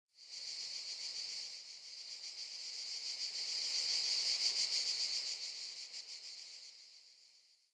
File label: Wetland Forest Day Cicada Swell; Midrath Island; Ambience